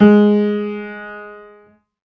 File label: Piano, Harmonic